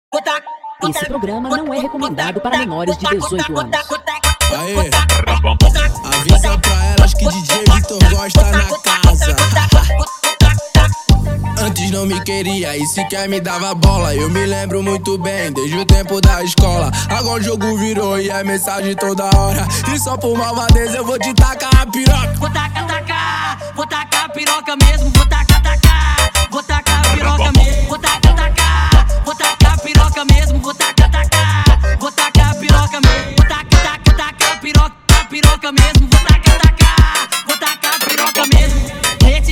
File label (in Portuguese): remix brega funk